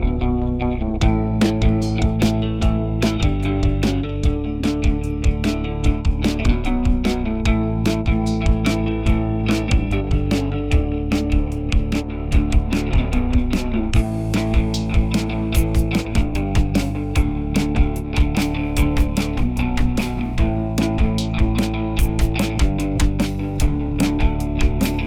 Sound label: wave music